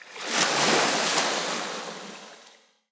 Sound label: ocean surf